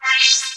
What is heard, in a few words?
VOC HIT, VOCODER; Zero-G Ambient 1